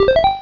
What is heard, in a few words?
round success